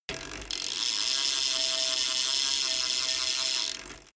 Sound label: bmx bike pedal once freewheel long